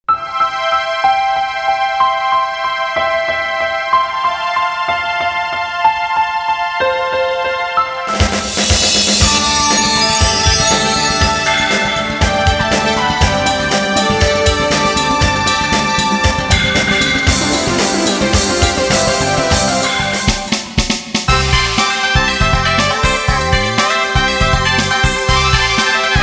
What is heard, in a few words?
jingle